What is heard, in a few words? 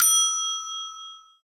Timer Time Up; SM3DL